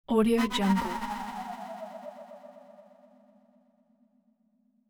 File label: scary scifi movie sfx